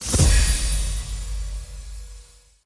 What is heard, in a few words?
big win text change; codex of fortune